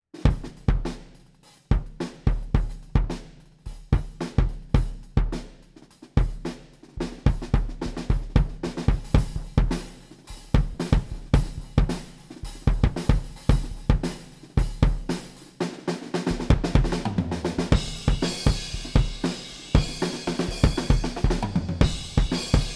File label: kick head on close; U47